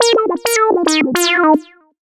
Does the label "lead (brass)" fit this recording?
Yes